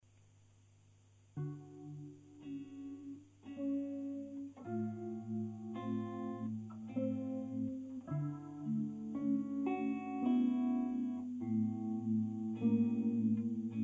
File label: Waltz